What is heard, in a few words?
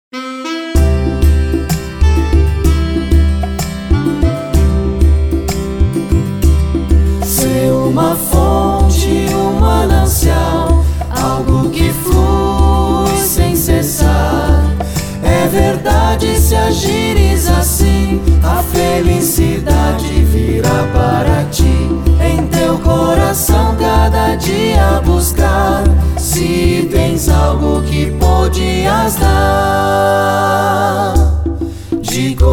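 Song, Portuguese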